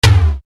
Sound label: KICK, Yamaha RY-30